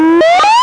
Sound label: siren meltdown; Babylon 5